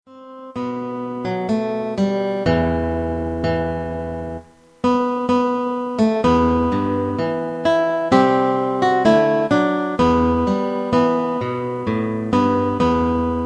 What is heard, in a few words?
synth